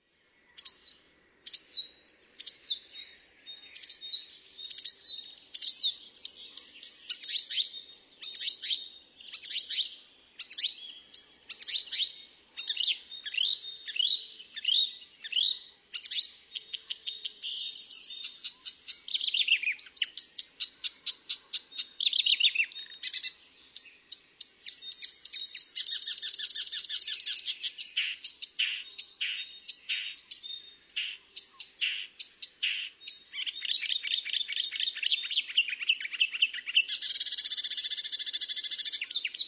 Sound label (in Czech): rákosník zpěvný